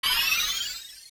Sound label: Butterfly Fairy; zelda; OOT